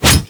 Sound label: Ghoul Devourer Melee Swipe; Warframe; Grineer Ghouls